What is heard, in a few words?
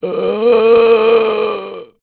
zombie die, male